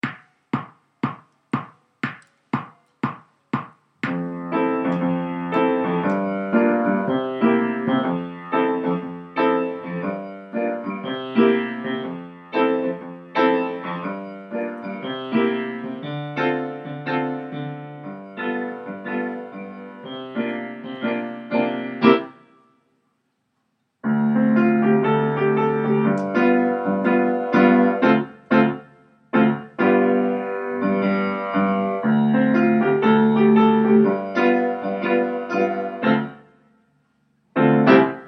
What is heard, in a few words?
piano